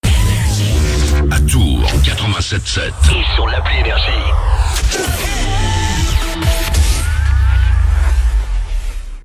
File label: JINGLE; TOURS, 87.7 FM; NRJ